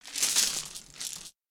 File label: Lego Droppings; foley